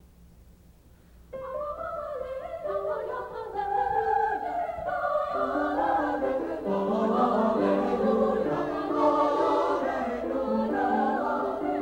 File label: Alleluia, chorus; J.S. Bach